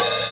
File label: low treble closed hi-hat sound a sharp key, foot cymbal